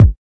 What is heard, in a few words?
kik